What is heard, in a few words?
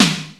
DDR30 SD; Crypton - DrumBase5000; RZ1